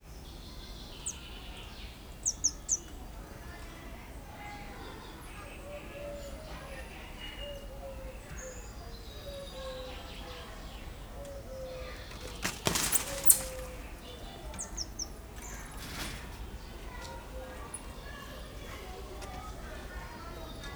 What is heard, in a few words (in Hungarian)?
vöröskezű tamarin; szegedi zoo 2011